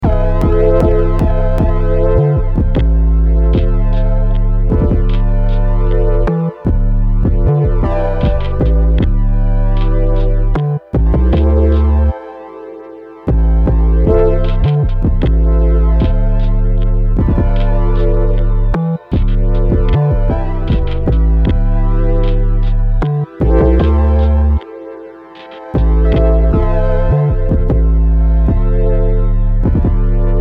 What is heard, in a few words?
154 BPM